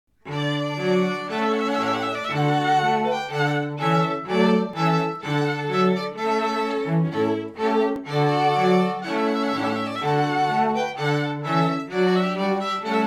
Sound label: String Quartet